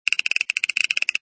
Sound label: move Cannon; santa cannon